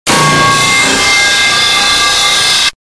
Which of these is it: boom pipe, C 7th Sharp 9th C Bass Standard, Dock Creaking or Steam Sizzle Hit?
boom pipe